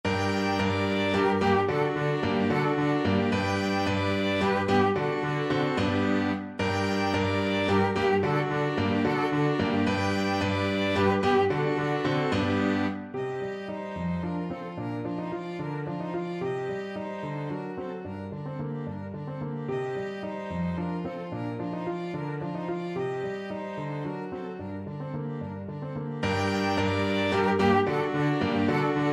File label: slavonic, piano quintet; dvorak